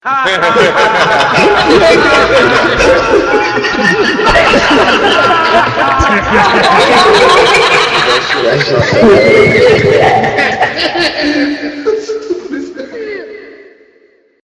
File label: laughs reverb